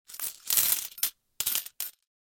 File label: coins in hand